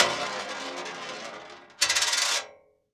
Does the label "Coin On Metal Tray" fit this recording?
Yes